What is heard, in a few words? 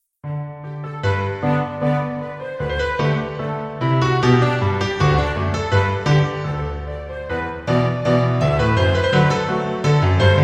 Orchestral Version